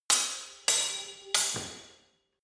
sword hit fast sword triple

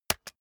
keyboard external space hit fast

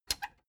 plastic button latch squeak click enable fast

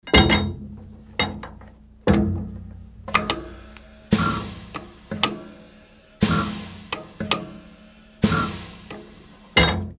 machine

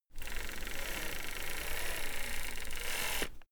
servo move powerful quiet

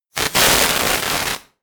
sparks synth dense

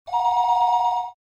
phone old

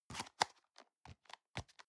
plastic box food small close medium

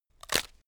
wood stress

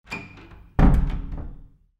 door

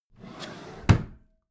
empty drawer smooth close regular

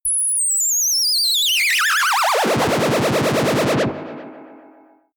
plasma gun ufo

